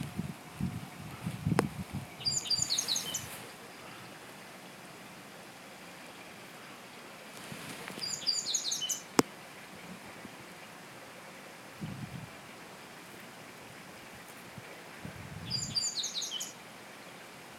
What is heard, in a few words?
New Bird